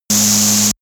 high voltage buzz; energie

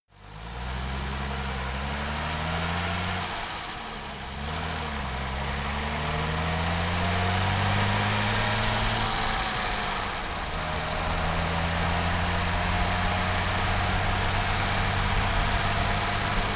car jaguar e-type drive steady engine

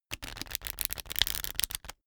pack of cards shuffle table

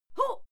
female fight scream huh short

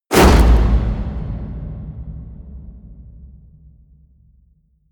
hit wood, hits impacts

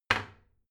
toilet seat slam soft; haus-garten; badezimmer

